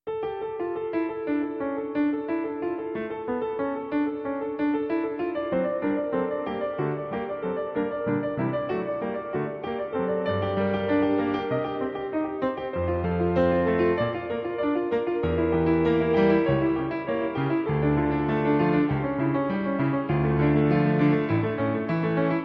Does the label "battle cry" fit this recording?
No